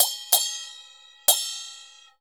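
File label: CHINA SM9 L, China cymbal; Spectrasonics - Heart of Asia CD2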